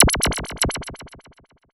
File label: Zapps, sci-fi